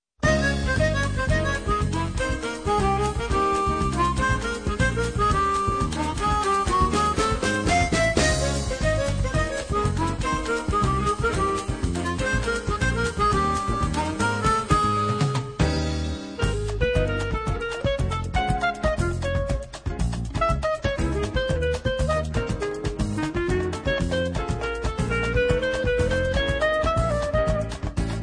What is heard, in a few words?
Samba